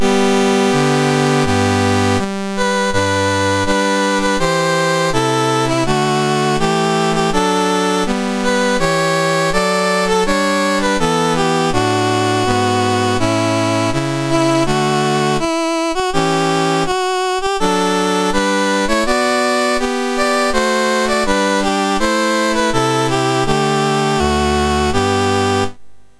National Anthems, MOS6581R3; Greenland; Dave Marquis

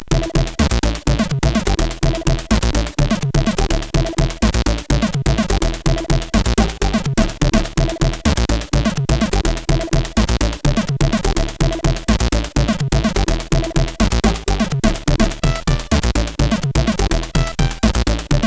Jazz, CSG8580R5

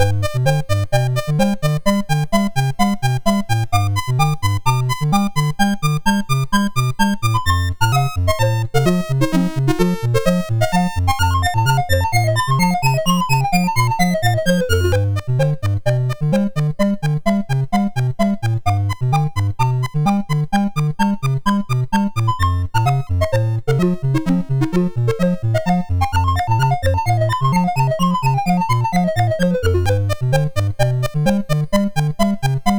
sid MOS6581R4, GAMES; Accolades Comics

